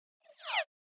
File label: Elect Tweet Wash, multimedia element